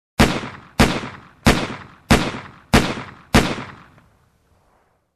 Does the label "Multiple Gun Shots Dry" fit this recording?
Yes